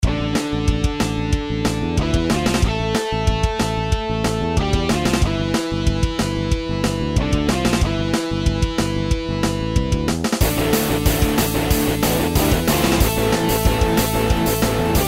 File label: Battle; Paragon